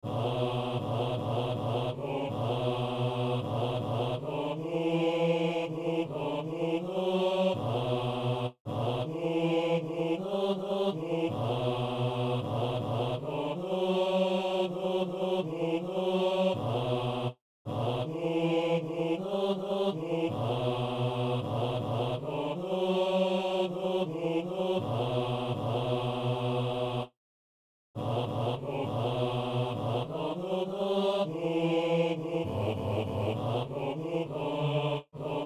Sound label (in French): basse